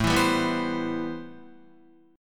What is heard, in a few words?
A-Minor 9th A Guitar; Am9 strummed; JGuitar